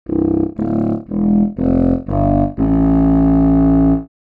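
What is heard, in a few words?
kontrafagot, contrabassoon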